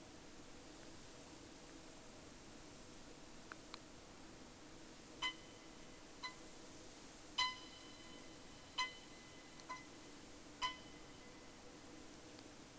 sound, Boat; SeaSnake

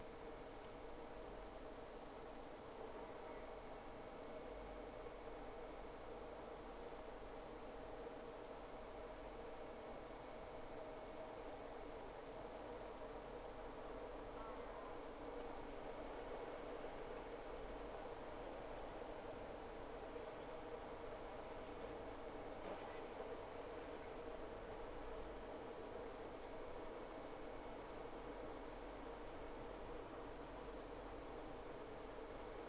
Whales; SeaSnake

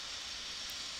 Background Noise

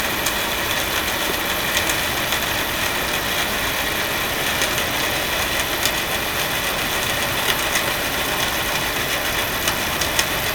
Hydrophones, orcasound

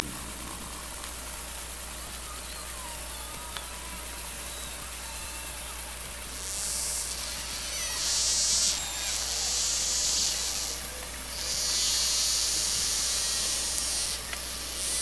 Hydrophones, orcasound

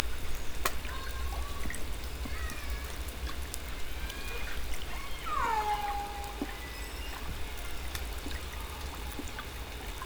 hydrophones, lime kiln

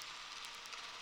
Boat Noise

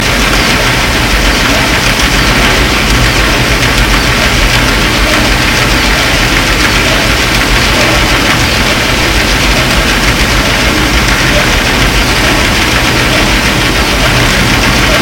Hydrophones, orcasound

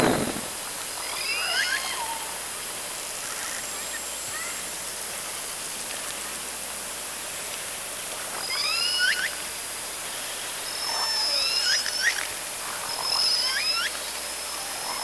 Orca sound Night Passby

